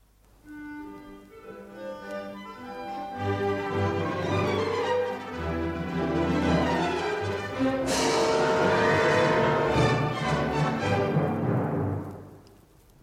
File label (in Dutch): eindtune; Paul Vlaanderen